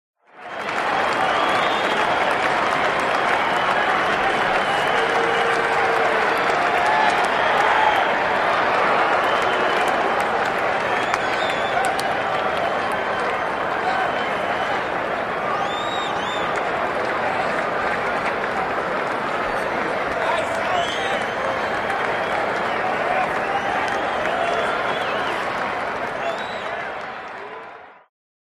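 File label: Stadium Crowd Ambience, walla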